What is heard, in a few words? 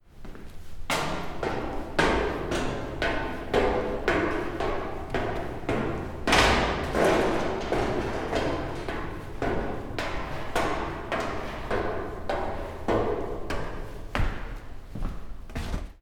Going Up On Inside Metal Staircase